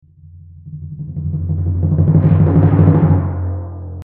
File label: crescendo